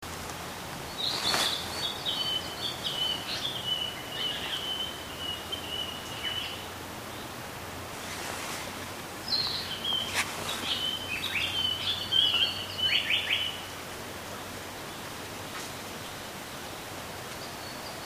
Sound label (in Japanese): sanko